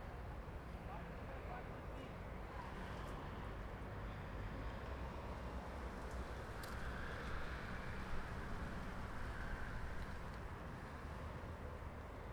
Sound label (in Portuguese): Transito rodovia movimentada com pessoas falando proximo; Coleção Sonora do Cerrado